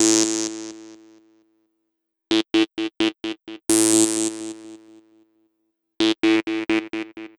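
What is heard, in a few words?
synth; Evolution 081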